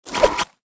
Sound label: glower power; Toontown Online